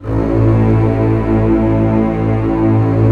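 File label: strings A0 FF L; Sound On Sound - Peter Siedleczek's Orchestra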